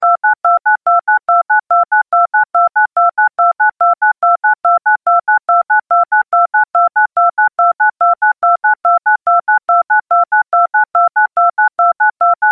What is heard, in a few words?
Alarmtone